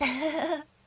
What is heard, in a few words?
giggle